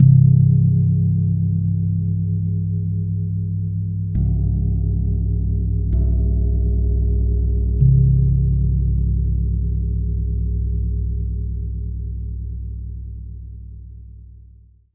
Long Gong Multi Hit; Longevity Gong; ETHNIC and WORLD PERCUSSION; Longevity Gong by Amani

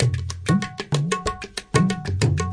two tablas, 94 bpm; 091 to 100 bpm

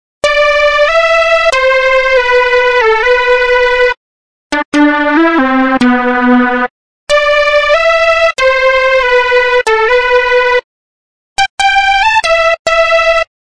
140 Melody; 140 Telephony

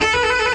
orchestra string hit D

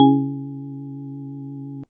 C sharp3, Two Tone House Organ

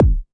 electro kick; Electro Kit; STYLE KITS (Hip Hop, Etc); Boy In A Band Electro Kit

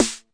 prophet Snare, Prophet with Processing; Hip Hop; STYLE KITS (Hip Hop, Etc)

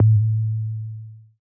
kick 808 Am hi; Kicks; single hits

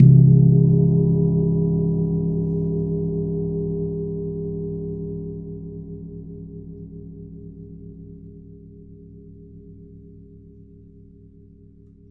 Long Gong Single Hit; Longevity Gong; ETHNIC and WORLD PERCUSSION; Longevity Gong by Amani

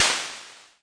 pt30 cym, CASIO PT-30; LO FI and 8 BIT KITS

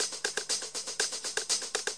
straight stereo hats, 120 bpm

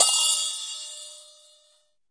chappa, Cymbals; ETHNIC and WORLD PERCUSSION

